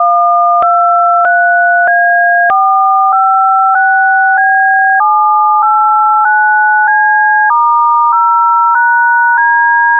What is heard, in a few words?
dtmf rows